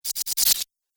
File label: Android Tech Parts, robot; Robot Sound Effects